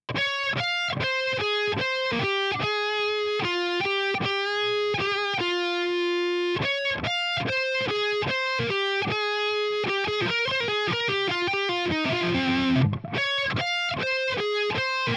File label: Guitar